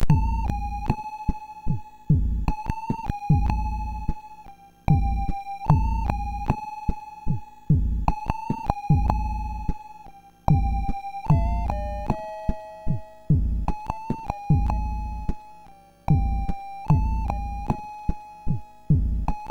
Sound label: Ambient, MOS6581R4